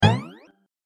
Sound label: Cartoon Trampoline Jump, bounce